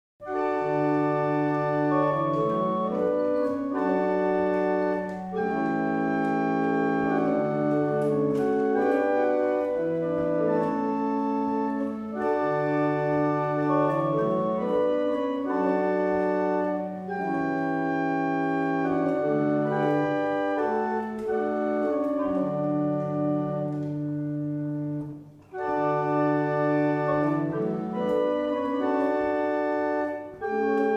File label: Double chant, barrel organs; Winwick; Battishill